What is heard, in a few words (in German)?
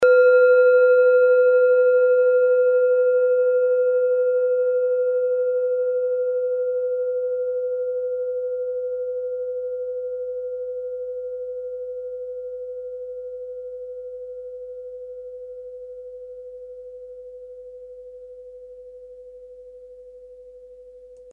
kleine klangschale; Planetentonschale: Schumannfrequenz Kleine Klangschale Nr.6 4.Chakra (Herzchakra); Diese Klangschale ist eine Handarbeit